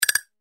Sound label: click; クリック03 クリック。